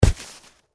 Log Drop Snow, Impact, Log, Drop, On, Snow; Wood (Sound Effects:Materials); BlastWave

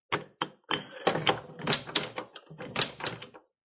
Wooden Door Lock; Locks; Lynne Music

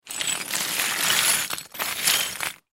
Crash Debris Rock Move Slide Push; Debris (Sound Effects:Materials); Blastwave

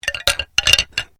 Bones Human Move

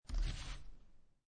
Poker Chips Grab Stack Of Chips From Table; Gambling Sound Effects

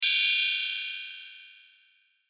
Hit High Metallic Ring; Blastwave

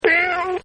BEYOW, button; Buttons; Multimedia; Partners In Rhyme

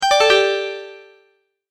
Multimedia Tone-Return/Back; Sound Design; McKinney Sound

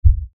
LFE Hit, Lfe Hit Low Bass Impact

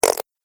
Cartoon Slime Splat; Slimy Sound Effects

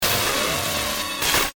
Glitch, Electrical; Production Element, Imaging Element, Accent, Transition; BlastWave

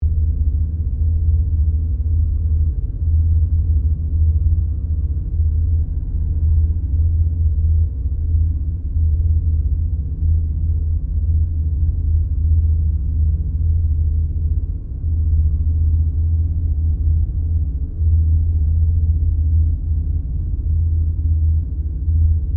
Created Drone, Drone Sound Effects; sound building blocks created through manipulation of raw material

